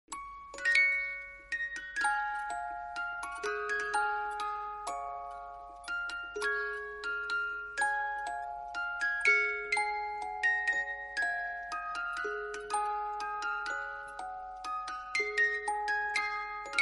soft romantic musical box tone ringtone

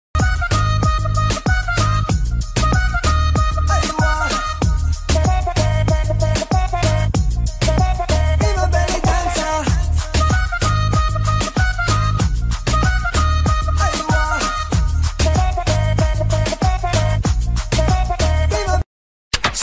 original mix ringtone, english

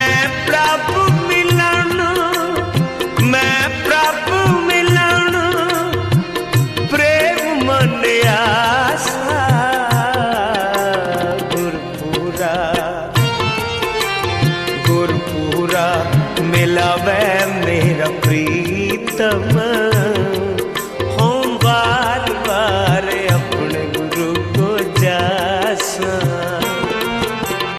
punjabi shabad ringtone

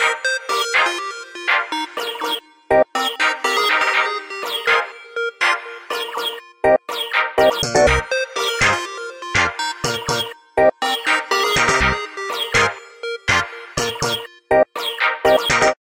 lg g3 ringtone

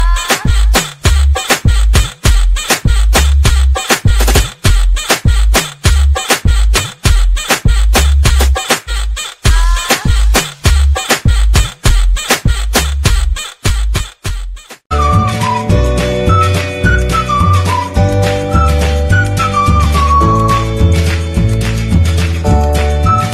english ringtone

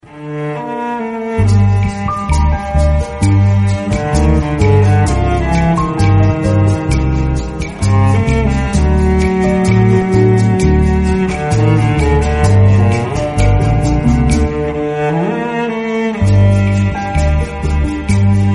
most sad background music tone ringtone

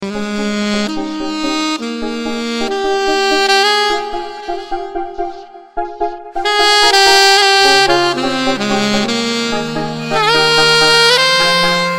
sax tone ringtone

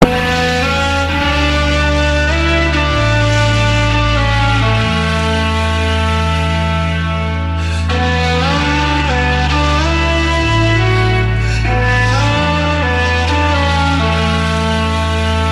soft background music tone ringtone

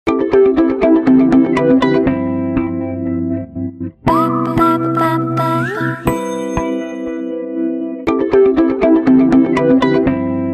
samsung ringtone; travelling s10 plus

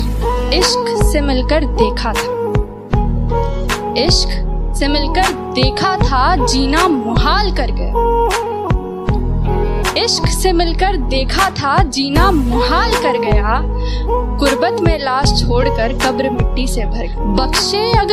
bollywood ringtone

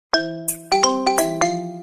xiaomi black shark 5 pro sms ringtone

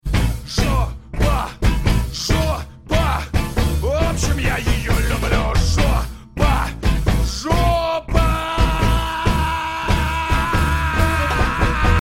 funny background music tone

